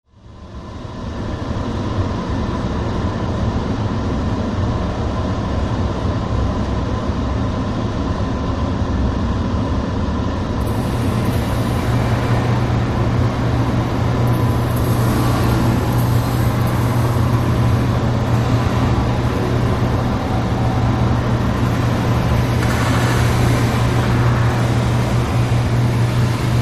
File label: Sewer Noise Subterrane, air